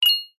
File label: multimedia game sound drop glass jewel or other item